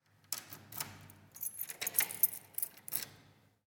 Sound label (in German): Tuer zu und abschliessen